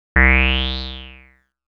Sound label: metal beat, Synth